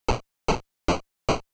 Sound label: Noise Kick, chiptune